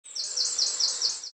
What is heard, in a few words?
smallbird; ambient; Garry's Mod; tf2; rottenburg